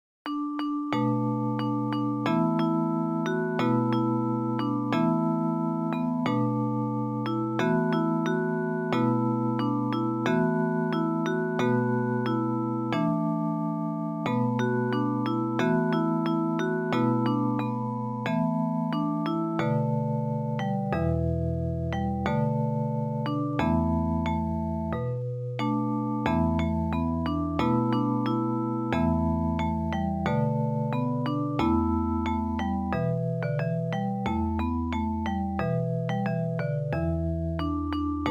japanese folk song; mikuni bushi

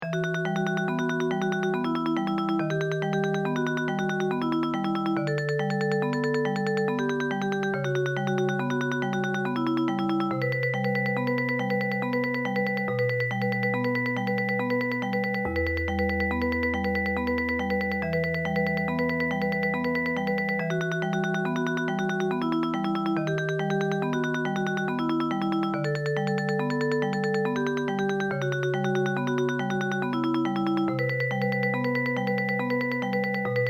tremolo pieces postlude

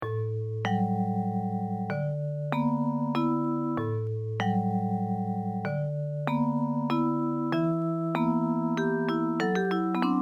folk song of kyoto; chatsumi bushi

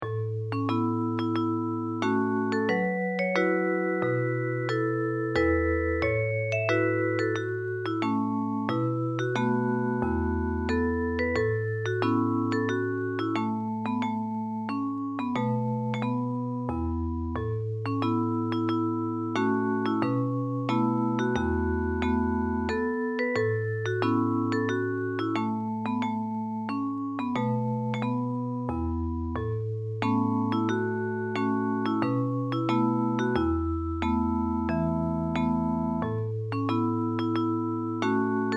japanese folk song; hietsuki bushi

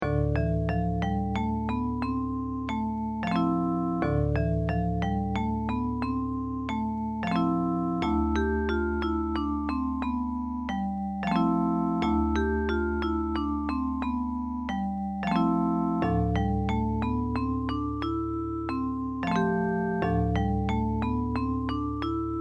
short melodies dorian melody

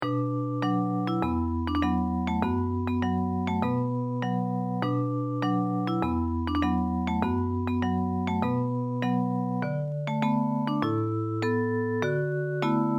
japanese folk song; hanagasa odori

